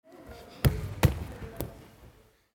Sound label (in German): Judo Matte